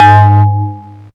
BELL BASS, Hard & Loud Techno; USB Soundscan vol.01; AKAI

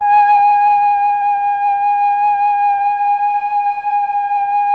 MELLOTROF

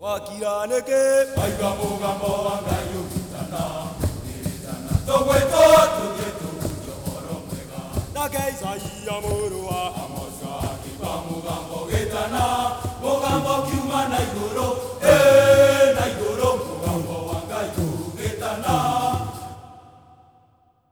Heart of Africa; Spectrasonics; Heart of Africa 2

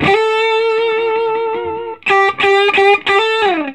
Vintage Blues Guitar, SOLO

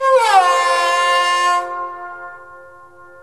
SARANGI, Indian Ensemble

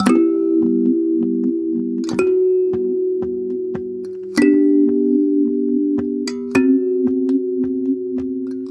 Kalimba, African

